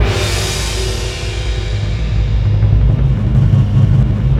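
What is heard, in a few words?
125 bpm, daiko, low drums; Spectrasonics; Supreme Beats; World Dance; Asian Daiko; Daiko Rolls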